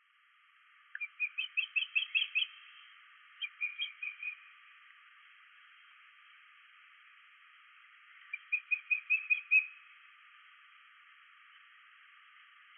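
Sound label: osprey, himeji; misago; koe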